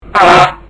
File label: fart